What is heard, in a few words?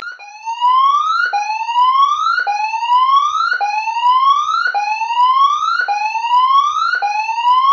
tsunami sirens standard signal multiple tone repeated rise